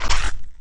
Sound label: player hit; zoombie grammar game